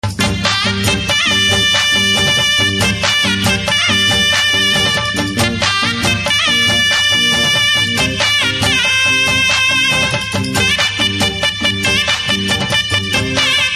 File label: BGM Telugu Ringtone